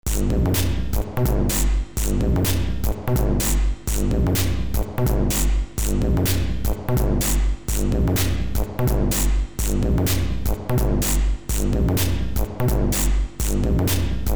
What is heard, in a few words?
drums